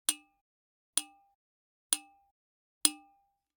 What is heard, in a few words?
Generic Click Glass Tiny